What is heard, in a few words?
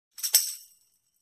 Tambourine rattle and hit